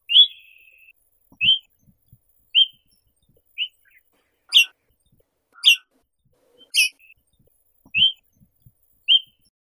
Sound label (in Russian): chechevitsa bolshaya carpodacus rubicilla